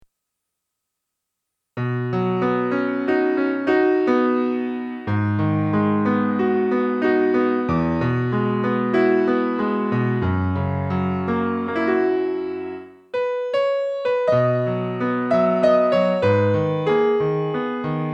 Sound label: piano; kalimba